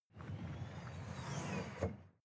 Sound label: sports car ext bonnet hydraulic